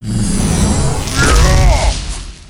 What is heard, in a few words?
scholar atk; monster